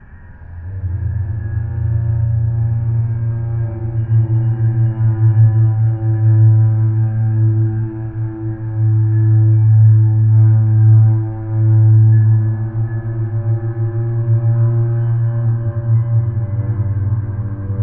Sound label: Lava Cello, Ethereal Textures; Sonic Foundry (Sony Creative Software); Ma Ja Le; Soundworlds